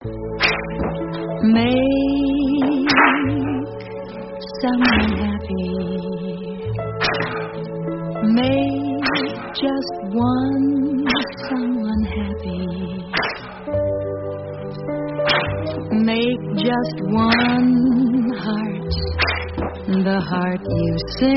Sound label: happy guy with song